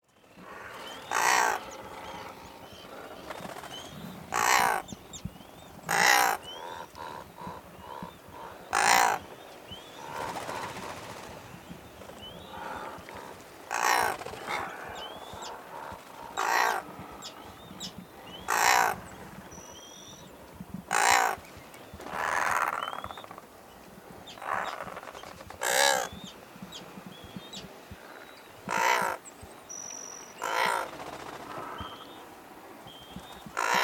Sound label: Razorbill